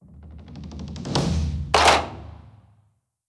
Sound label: Taiko; blue archive